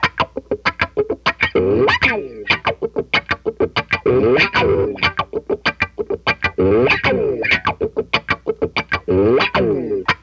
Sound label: WAH GTR, Funk Gtr & Wah; PlatinumPhattCD2; E-MU Producer Series Vol. 8 – Platinum Phatt